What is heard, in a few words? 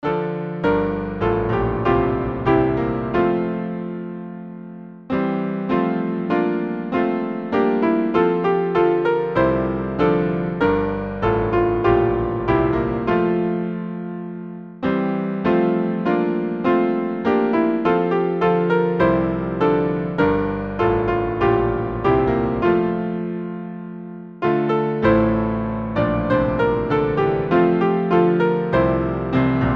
Hymn, piano; I sing the almighty power of God; Forest Green